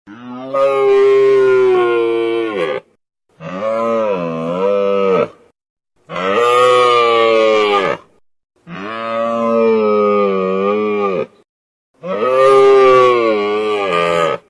Funny Cow Sound

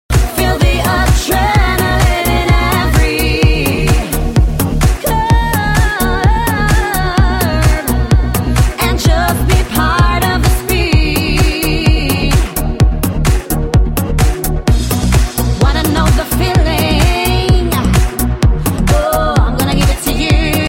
Speed Vocals

